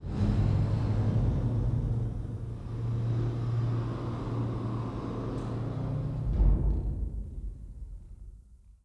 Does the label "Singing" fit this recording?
No